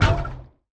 metal barrel inventory move; factorio 2.0.55

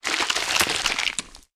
big gore; factorio 2.0.55

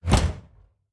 artillery close; factorio 2.0.55

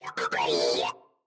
construction robot; factorio 2.0.55

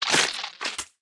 spawner gore; factorio 2.0.55

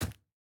grass, walking; factorio 2.0.55

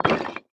brick inventory move; factorio 2.0.55

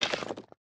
resource inventory move; factorio 2.0.55

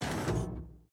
gate open; factorio 2.0.55

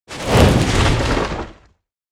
car debris; factorio 2.0.55

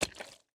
shallow water, walking; factorio 2.0.55

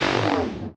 energy shield inventory move; factorio 2.0.55

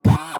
roboport door close; factorio 2.0.55

